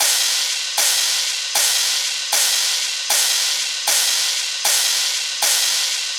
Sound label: Deathstep 155bpm Crash; EDM Drums; Ghosthack; Drum Mega Bundle Demo; Ultimate EDM Drums; Deathstep Kit 20